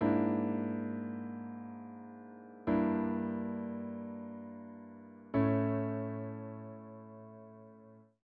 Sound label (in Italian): Successione di Accordi; II ipotesi